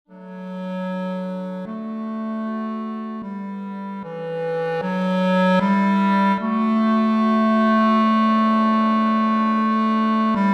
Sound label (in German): Klarinette